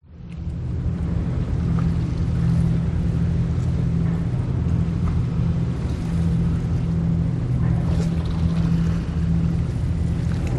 industrial port cargo shipping park waves splash close distant drone hum machinery